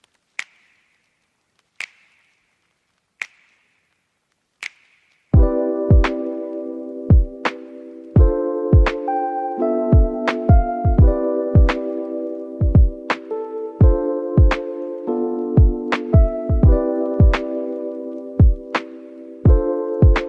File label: Sunlight & Rhodes, Lo-Fi Hip Hop; 春